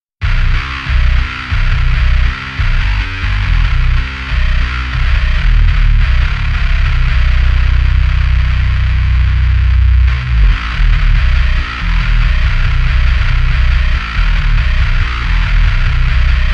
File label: bass; avedis mutter monolith; polarity audio